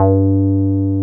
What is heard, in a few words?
303 SQUARE G3; 303 SQUARE BS; Drums In Your FACE!